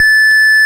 Lead A5; Ableton Foundation FreePacks; Foundation Glitch Toys & LoFi

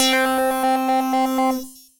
Tubular Hell, synth lead; Ableton; Factory Packs; Punch and Tilt

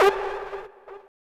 175 D&B Synth Abm; Dance DJ

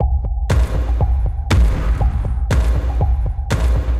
Impact Grip Kit, drum racks; Ableton; Factory Packs; Mood Reel

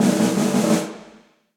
Snare Press Dark Plate Verb; Snare Articulation; Drum Hits; Session Drums Club; Standard Hits; Ableton

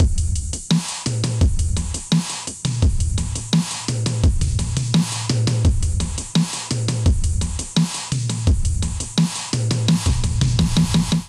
Kit 85 bpm, Drums; MIDI Clips; Ocean Kit; Drive and Glow; Ableton; Factory Packs

